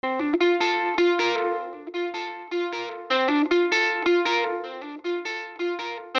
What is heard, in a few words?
Lead